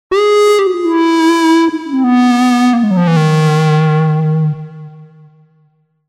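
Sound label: Square Lead; TURBO VL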